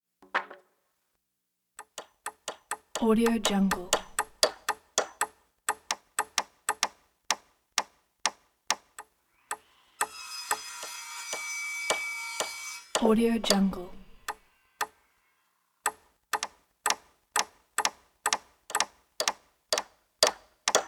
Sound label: framing two workers hammer nails; prosoundfx